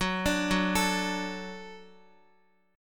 Gb Minor Sharp 5th, Guitar; Gbm#5; arpeggiated; x,x,6,7,6,5; 4F,4A,5C,5G,5C,6E

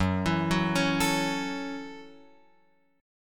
Gb Minor 7th, Guitar Open F; arpeggiated; JGuitar

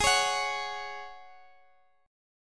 G sharp 7th Suspended 4th, Guitar, DADGAD; down; chord shape

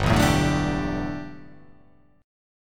G 6th Add 9th G, chord

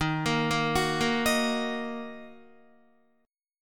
Eb Minor Guitar; Ebm arpeggiated; JGuitar

